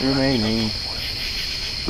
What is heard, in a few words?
wood